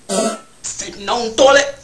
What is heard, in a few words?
toilet fart